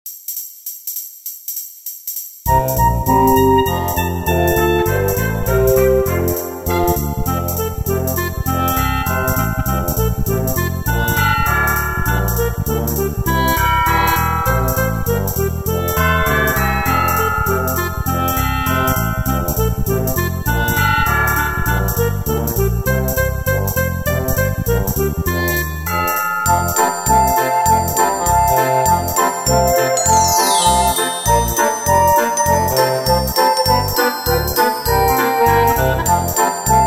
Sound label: Jingle bells